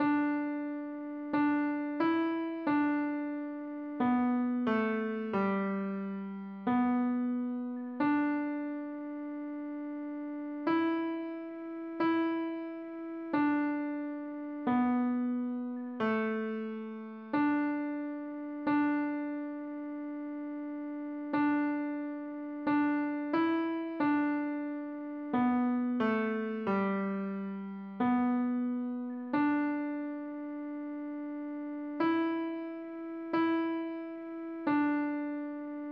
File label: piano slow; slow tempo; PlayScore